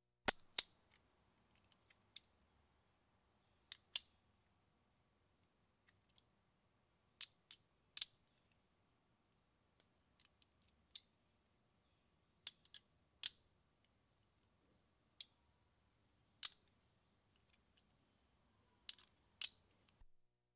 Police, Fire engines; Valentino Production Sound Effects Library CD50

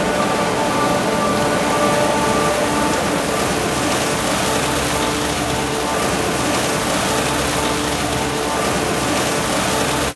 car wash; best service Blue Box

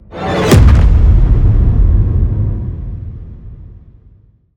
Ice Spell Barrier Defense Frost Ward; Arctic Aura

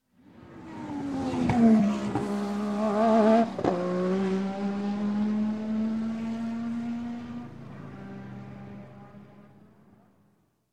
Formula 1 Race; Sound Ideas; Series 2000

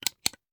Hand Gun Foley; Sound Ideas; Guns

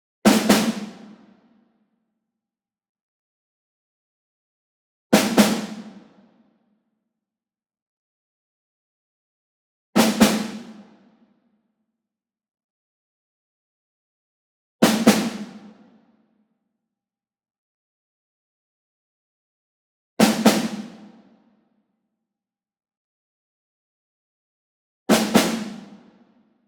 ENSEMBLE SNARE Group Double Medium; boom library; Cinematic Strikes Construction Kit

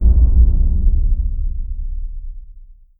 zombie detect impact; DeadFright

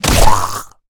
zombie hit; DeadFright

